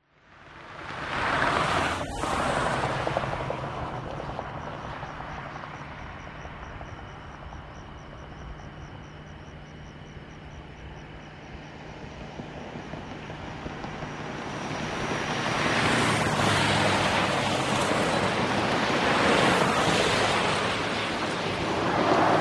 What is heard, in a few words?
Highway Traffic; SOUND EFFECTS1